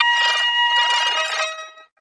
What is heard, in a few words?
vocoded tone fiddle, Synth